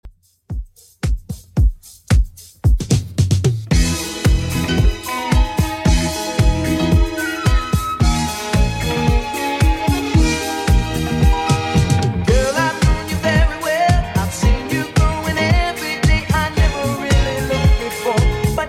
70s 112bpm; Redrum

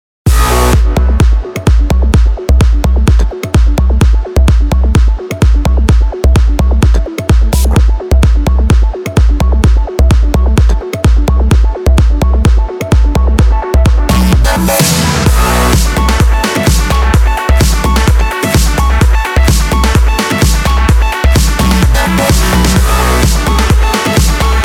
128 bpm

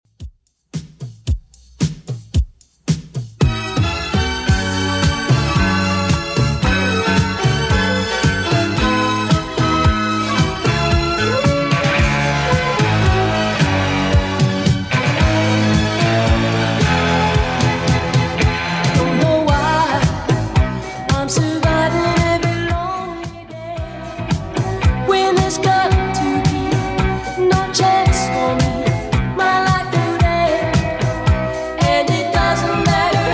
70s Redrum 112bpm

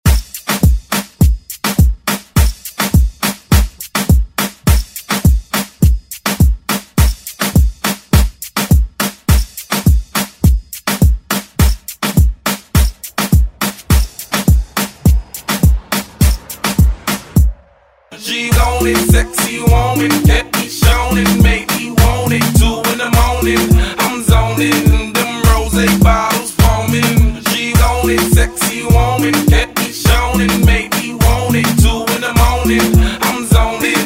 Moombahton Hype Redrum

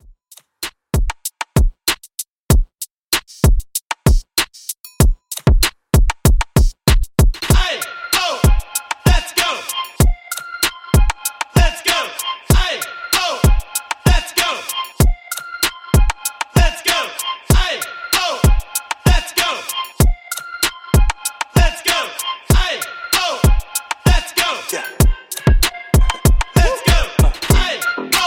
Hype Redrum 96Bpm

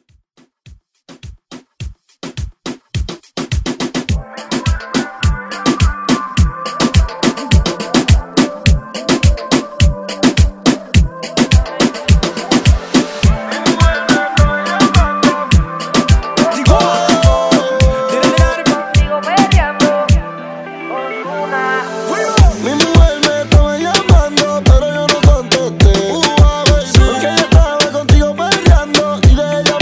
Reggaeton; Redrum

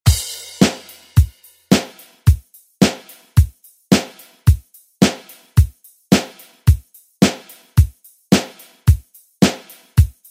60s Re Drum

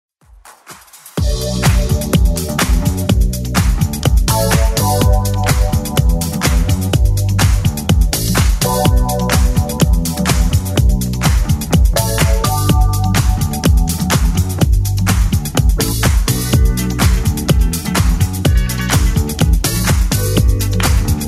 70s Club Edit 125 bpm

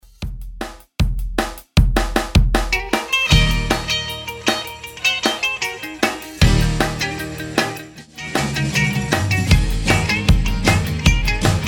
60s 155bpm

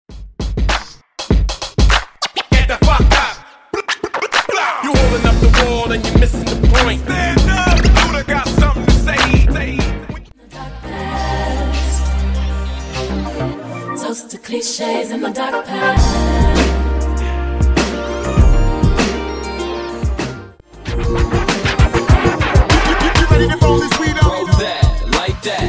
Megamix

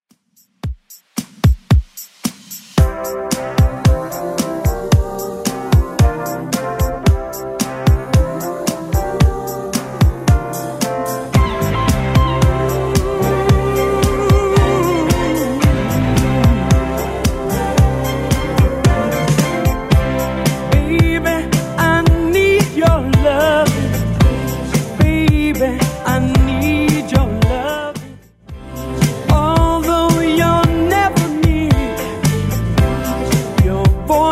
70s Redrum 112 bpm

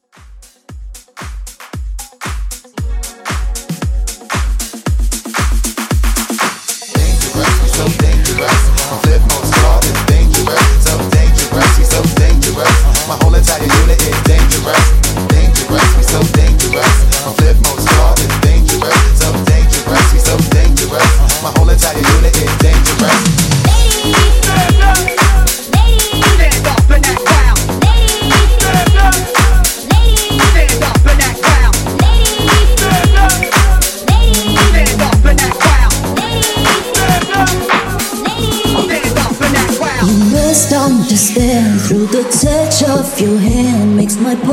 nudisco hype mix 115 clean

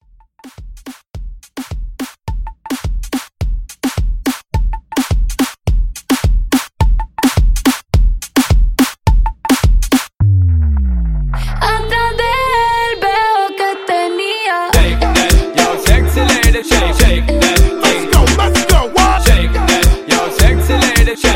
Hype; Remixfreakz

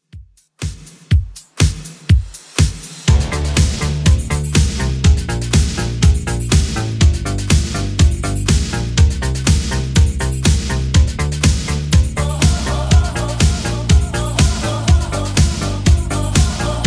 80s Redrum 122bpm

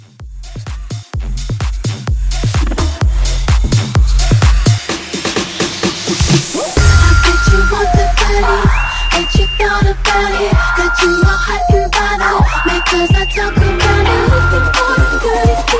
Hybrid Bootleg 128

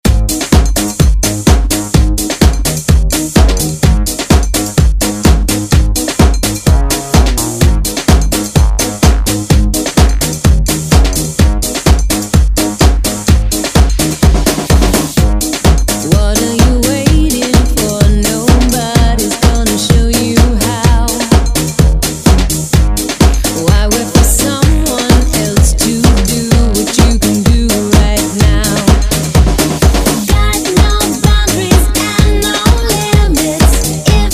Afrobeat Redrum

